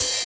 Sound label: Open Hhat, HiHat; Equipped Music; Loungin House